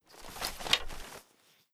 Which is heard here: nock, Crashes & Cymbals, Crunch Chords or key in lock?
nock